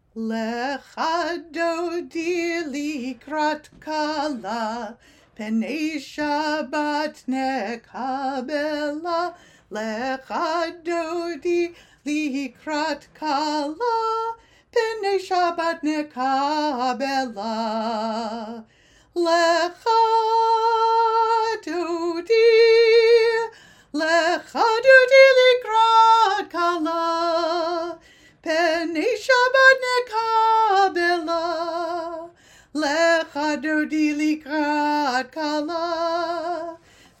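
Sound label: Lecha Dodi Chorus, Group Songs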